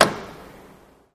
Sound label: Bed Motor Elect End; latches & bolts